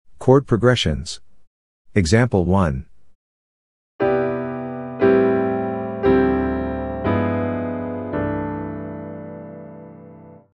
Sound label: chord progressions